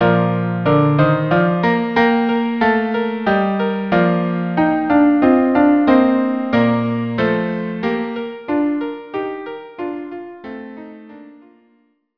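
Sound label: cello piano; Blessed Spirits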